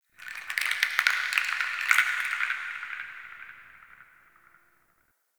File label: Wood Chimes; Ambience; Warframe; Nokko Colony